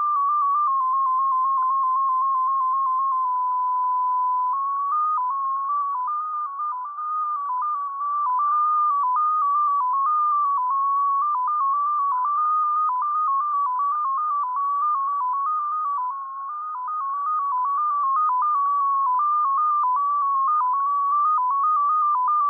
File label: RUS INTEL 16 tone 7.49 Bd, MFSK